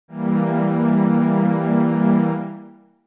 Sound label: E Diminished